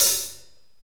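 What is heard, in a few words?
hi-hat, Techno Rock; Northstar; Drumscapes Roland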